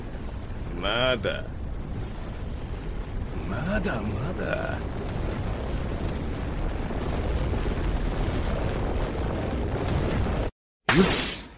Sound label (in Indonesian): Nada Dering Anime; Mada Mada